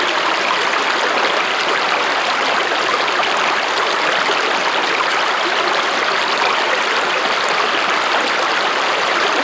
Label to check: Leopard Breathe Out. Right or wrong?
Wrong